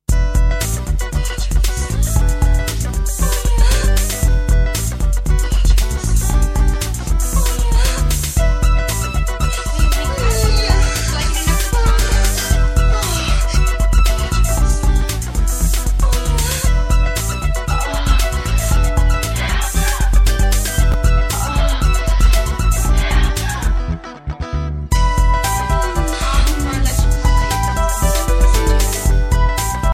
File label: funky sexy one